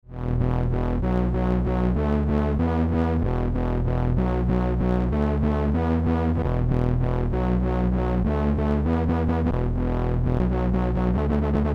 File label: wah